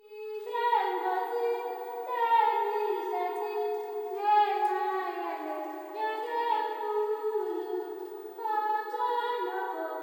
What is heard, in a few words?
LTLE GIRL, AFR.GIRL; Spectrasonics; Heart of Africa 2